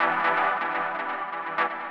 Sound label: SYNTHPAD HOUSE 125 A; eJay; Sphere